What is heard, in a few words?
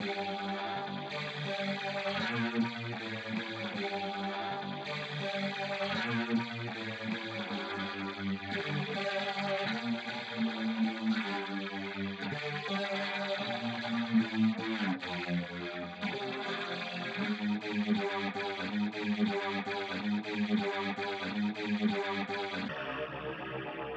guitar